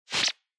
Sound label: Motion Agility Single